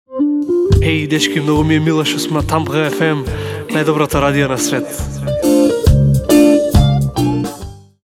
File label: Jingle; Europa